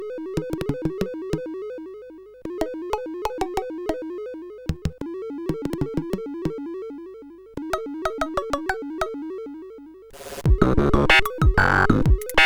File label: laidback version, sid CSG8580R5; hvsc